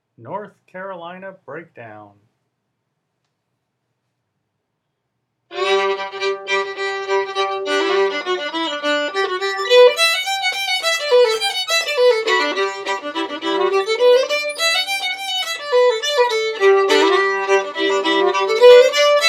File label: Breakdown, fiddle; festivaltunes